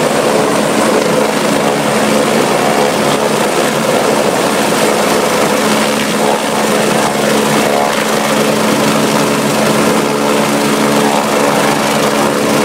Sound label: ec145 tail